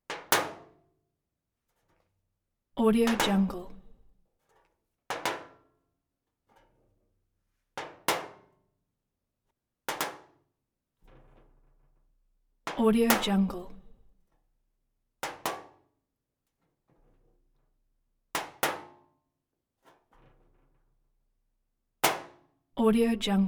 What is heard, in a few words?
magnet small magnet stick on hollow metal